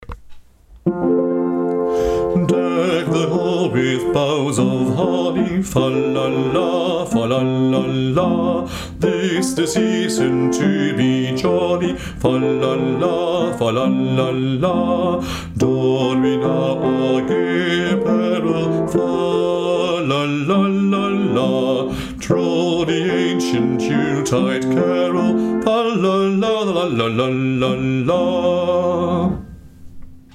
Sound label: bas; Deck the hall